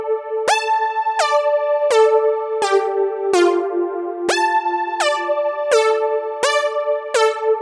126 Am glidey lead, synths and melodic loops

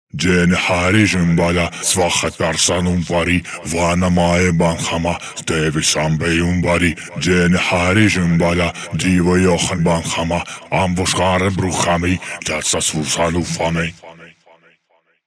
138 Shamanic Voice (Wet), Psytrance

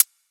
HiHat, Psytrance; Drum Shots

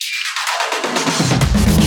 Minimal Fills, Minimal House; Minimal Loops

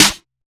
snare; Singomakers; Psytrance Mantra; Drum Shots

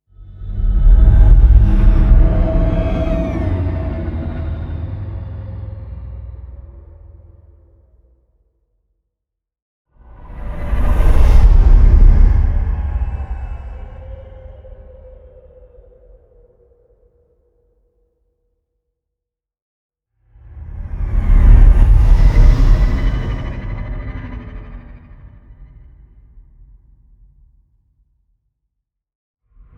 WHOOSH; Boom Library Cinematic Horror Bundle; HRDS; Soulspirit

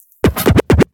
Minimal Fills, Minimal House; Minimal Loops

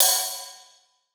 Ride, Cymbals, Drum Shots; Psytrance; Singomakers; Psytrance Mantra

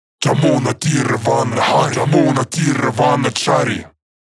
138 Shamanic Voice (Dry), Psytrance; Shamanic Voices

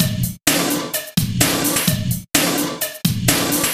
Minimal Beats, Minimal House; Minimal Loops

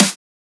snare; Singomakers Astral Psytrance; One Shots; Snares

